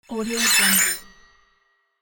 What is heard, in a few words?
microphone chaos